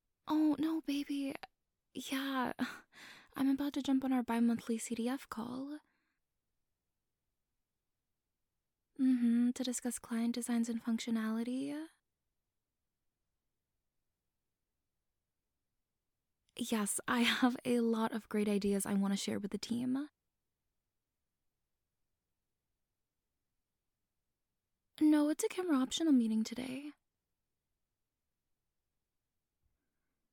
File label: Whiny Moans